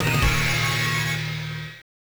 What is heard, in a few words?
Gong Crash!; gamerip; martial champion